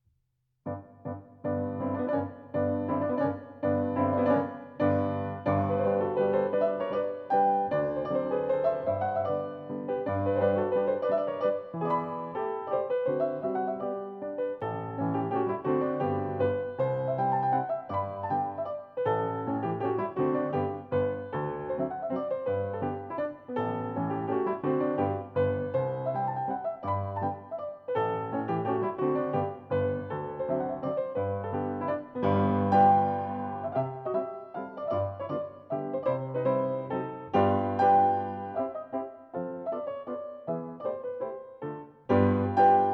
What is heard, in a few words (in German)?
G-Dur, Mazurka; Chopin; op 67